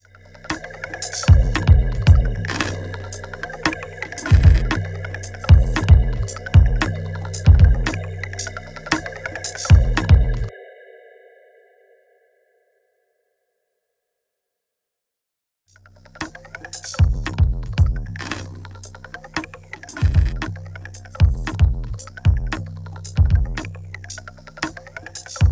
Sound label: Electronic Beat Possible UFO Sighting; Temperance Pro